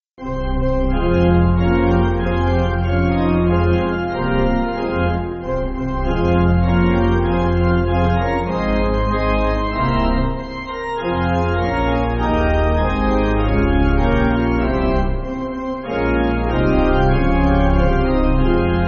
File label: Pipe